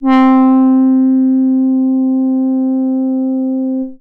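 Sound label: brass C4, ARP 2600; Polyend; Simulacrum Sounds; 1 Shots; Synths; Keys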